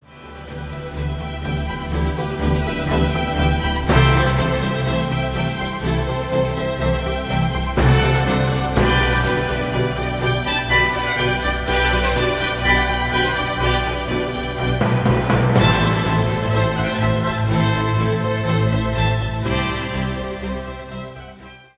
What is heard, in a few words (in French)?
Musique; Ligue Des Champions